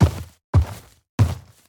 嗅探兽脚步声

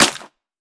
沾泥的红树根踩踏声

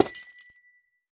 紫水晶破坏声

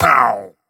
受伤声; 掠夺者

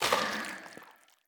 幽匿尖啸体破坏声

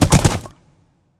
骷髅马水中疾驰声

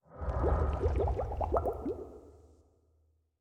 气泡柱漩涡环境声; ambient

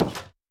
下界木门开关声

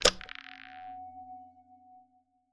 磁石锁定声

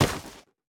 菌岩踩踏声

